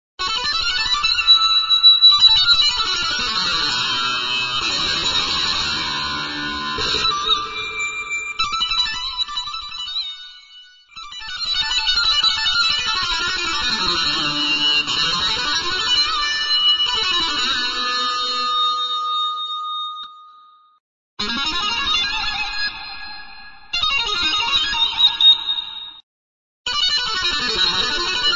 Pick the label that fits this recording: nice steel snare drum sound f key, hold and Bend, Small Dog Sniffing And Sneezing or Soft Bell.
hold and Bend